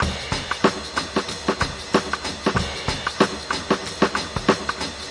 jazzy distant drums, 93 bpm; 091 to 100 bpm

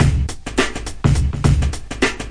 104 play hop, drum loops and breaks; 101 to 110 bpm

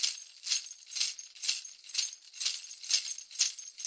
124 bpm shaker

